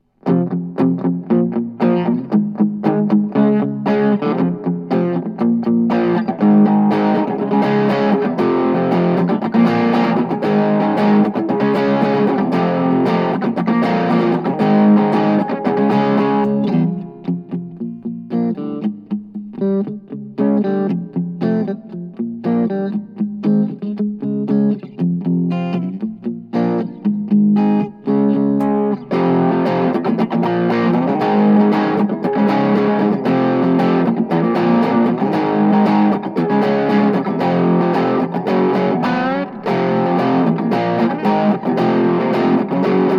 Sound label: klein headless; white